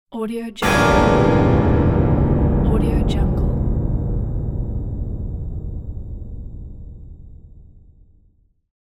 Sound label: horror jump scare hit